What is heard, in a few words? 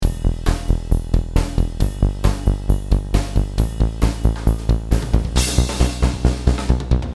drum